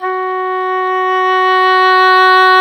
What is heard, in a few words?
OBOE, Orchestral Winds, Dry; Roland LCDP04 Orchestral Winds; Oboe 4-8; Oboe Dry 6